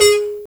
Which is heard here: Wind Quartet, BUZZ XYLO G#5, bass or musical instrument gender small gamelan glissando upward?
BUZZ XYLO G#5